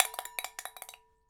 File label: Ping Pong Rattle, Large Wine Glass